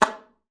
SLPB A3, ALTOSAX; Xsample VOL-2

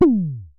Synare Filter; Star Instruments Synare 3

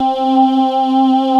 CHORALIS, DANCE; Pro-Rec

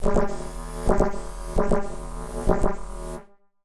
Casio, Glitched, malfunctioning synth

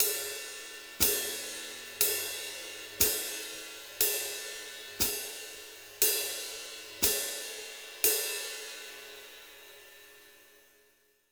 RID, Jazzistic, 060; Univers Sons

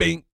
BASS FX, Vocal Planet, Jazz FX; Spectrasonics

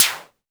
polyvox snare; Formanta Radio Plant

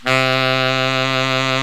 SAX COMBO

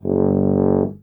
BRS TUBA, Solo Instruments

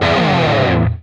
PRS SLIDE, guitar

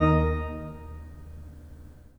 PIPE, 3DOrgans

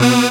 synth b, Dance Synths, Stabs & Hooks; Best Service ProSamples vol.37

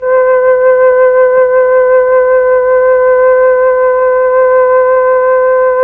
FLT, Melotron Flt, Slow Flutes; Roland LCDP09 Keys of the 60s and 70s 1

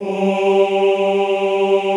OOH G2, Classical Choir; Best Service; Extended Classical Choir

